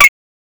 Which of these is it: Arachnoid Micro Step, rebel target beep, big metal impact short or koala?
rebel target beep